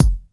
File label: sharp steel kick drum f sharp key; kick drum samples